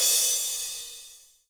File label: soft hit finish; osu